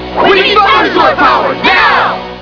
thunder

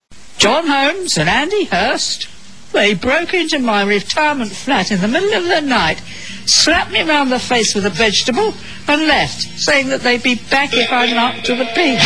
jingle

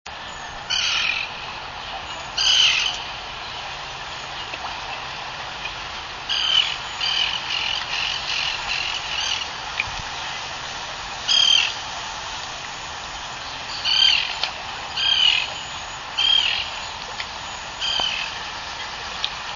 tern common; Point Pleasant, New Jersey, Lake Louise (salt water lake), 8/8/04, 4:00 p.m.; with Herring Gull and Royal Tern at end

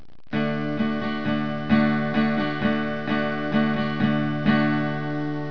strum

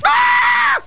AAHH; Homer

